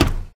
footstep metal low walk; ProSoundCollection v1 3 MONO

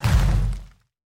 Rock Wall Hit

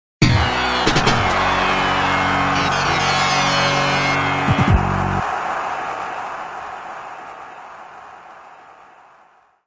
Goal; Fever Pitch Soccer; atari jaguar

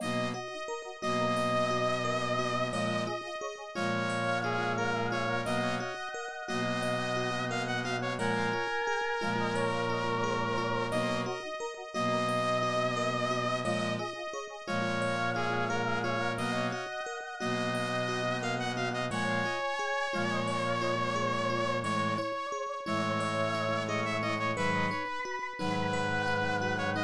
Light Room; rusty ms-dos

